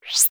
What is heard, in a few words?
Breathy up quick